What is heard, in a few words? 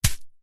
hacky sack footbag catch hand